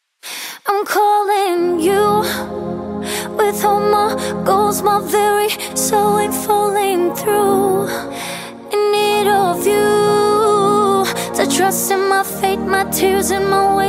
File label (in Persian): Sedaye Zan